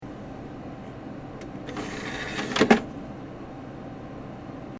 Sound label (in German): PC Laufwerk rein